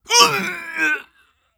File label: male damage